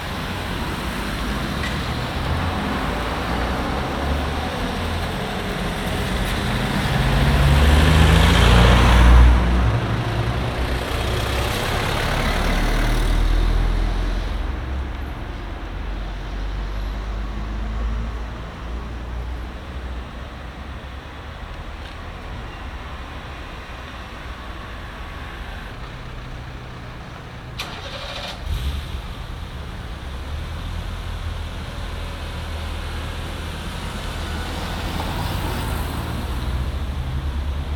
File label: city cars steps, street traffic; best service Blue Box